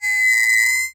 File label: Foley Metal Squeak High